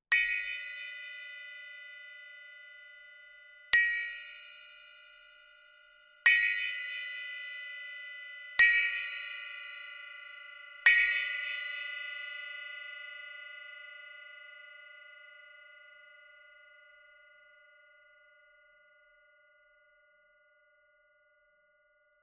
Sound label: bell